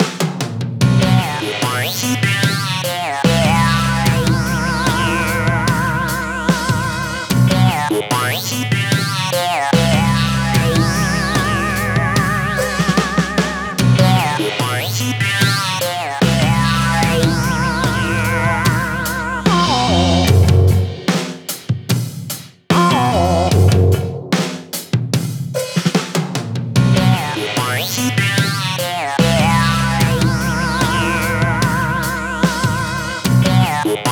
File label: Battle Defeat; Splatoon